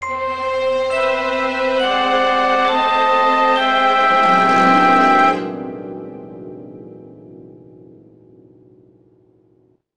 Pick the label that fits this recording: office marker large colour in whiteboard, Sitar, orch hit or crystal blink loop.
Sitar